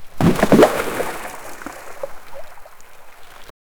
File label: big rock falls into water; falling objects